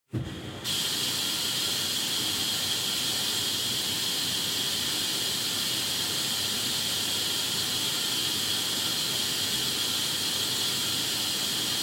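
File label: Washer Front Load Operation Noise Pour Water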